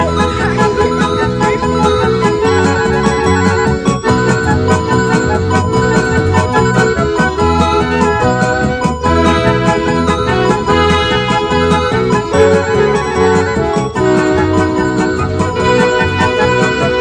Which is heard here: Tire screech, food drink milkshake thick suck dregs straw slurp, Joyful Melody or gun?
Joyful Melody